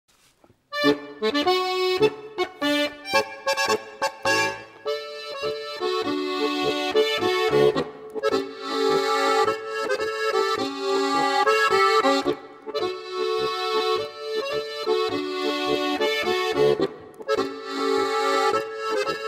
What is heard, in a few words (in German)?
marsch